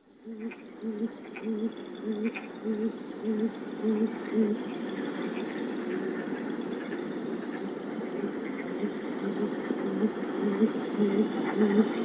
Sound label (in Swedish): Lappuggla